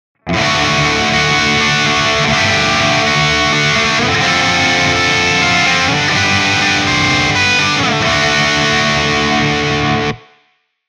guitar Van51

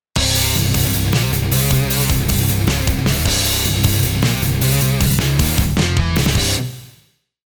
guitar pattern

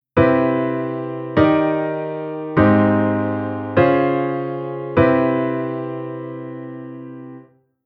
Chord Constant

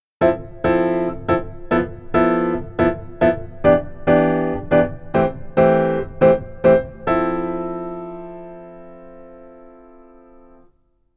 Keyboard Rhythm Pf Only

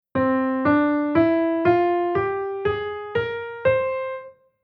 Chord mode C Mixolydian b13

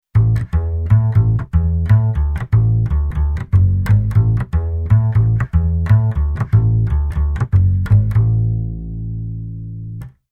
Bass Arr Samba Bass

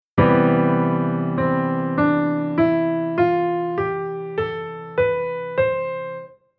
Chord Chord Scale Major

